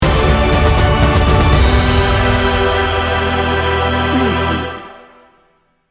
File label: quest for glory sting bumper